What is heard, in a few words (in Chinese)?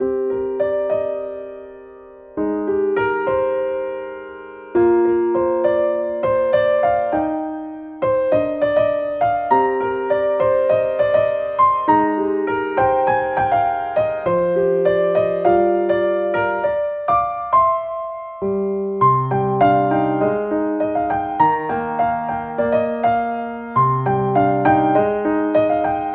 S.Piano Ver.; sanse hui lians sunnyrain lovestory 2017